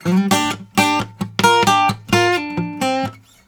Blues Guitar; ILIO; Fretworks; LICK

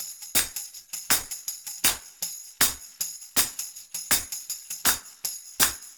tam cp hh, Percussion

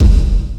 Kick Gate Reverb; Yamaha PSR E305

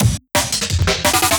Rock Fill, Loops, breaks; M8

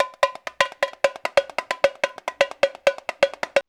Rio Batucada, 130 bpm

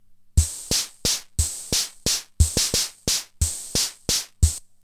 WALTZ, Eminent-Solina Rhitmix drum machine; 300 Drum Machines

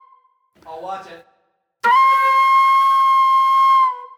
Flute, Disco

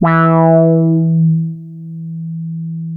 E4, Boom da Bass; E-MU Producer Series Vol. 6 – Analogia Project (CD 1)

